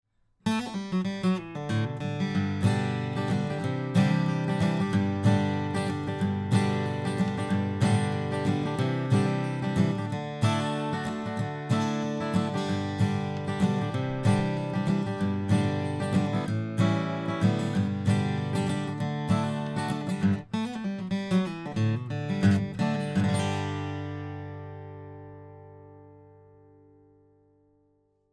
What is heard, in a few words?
Strumming